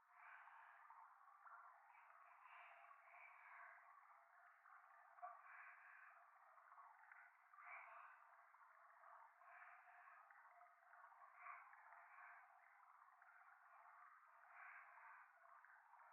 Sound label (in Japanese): 左耳 密着 処女 喘ぎ 川のせせらぎ; 夢の中